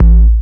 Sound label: KICK deep, Techno Trance DRUMS